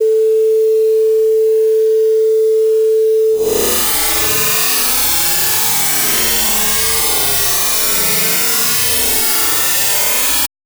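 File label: sine noise traditional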